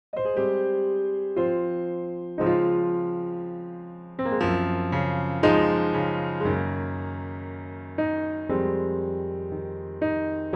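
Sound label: piano Db D, male; key - Db to D - vocal range - Ab to B (optional C# and D; Beautiful piano only arrangement, keeping more to an 8s feel rather than 4's.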